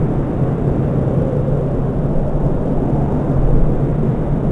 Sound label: wind, ambience; Star Wars Jedi Knight II Jedi Outcast; XBOX; bespin